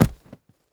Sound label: Land Feet; Afterbern Democralypse; Windows